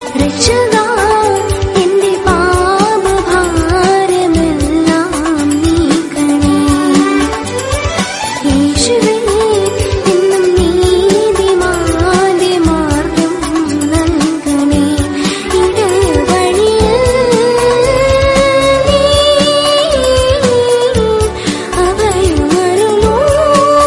malayalam christian

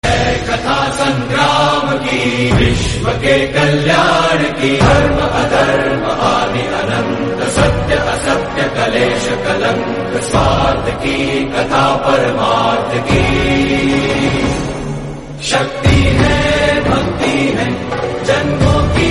title song hindi; mahabharat

